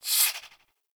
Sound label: Swing, Melee; Balance Two; Warframe; Weapons; Modular Melee